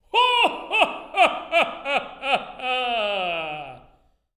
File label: LAUGH, OP. LAUGHS